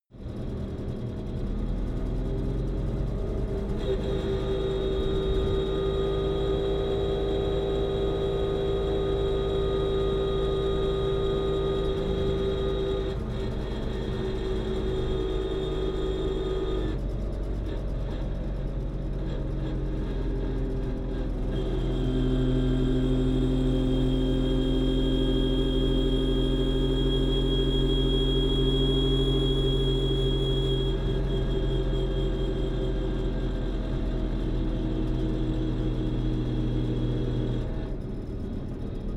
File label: car saab 92 drive slow interior